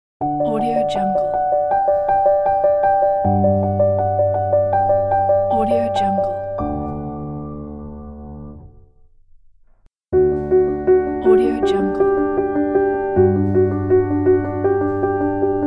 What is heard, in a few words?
piano logo